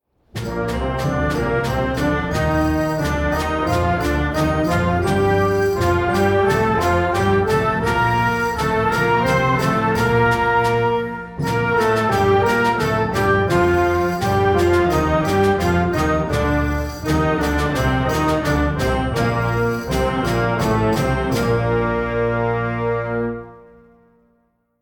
Scale Pattern

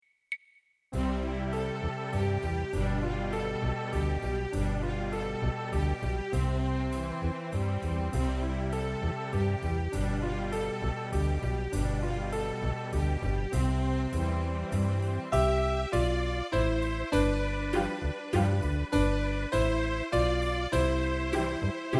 Lone Star Waltz

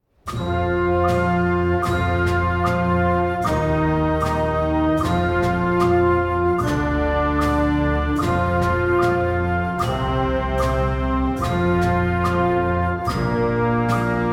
Expanding Intervals - Diatonic

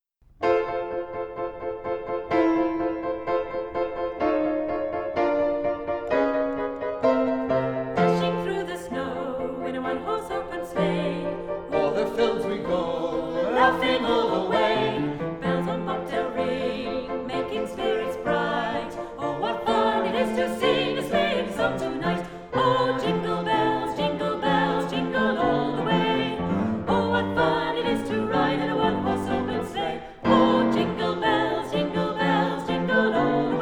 Jingle bells

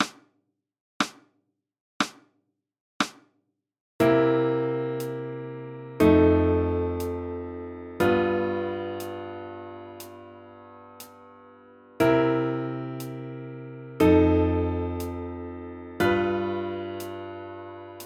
Blues Voicing

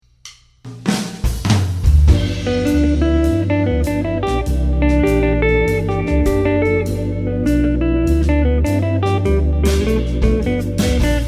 Slow Blues In G

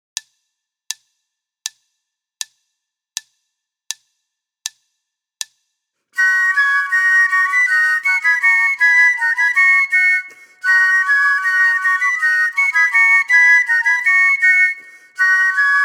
Air des Fifres ou Hautbois - Play-along

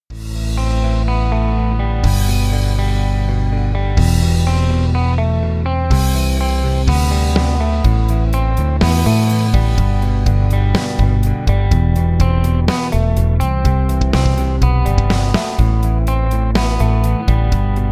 backing

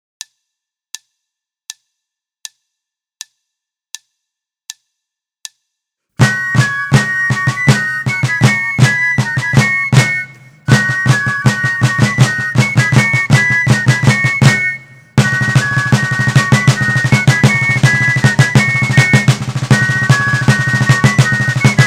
Air des Fifres ou Hautbois - Roulee

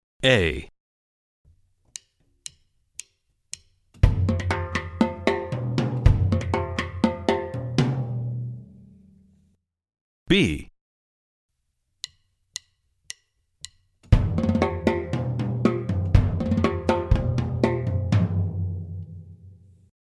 Rumba Beats

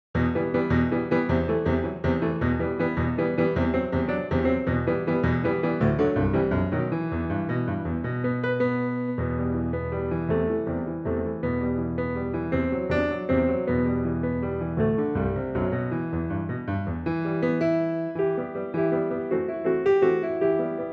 Samoian Fire Dance

